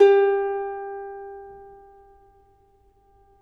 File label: CEL HARP G3, Historical Instruments; USB Soundscan vol.23; AKAI; CEL.HARP1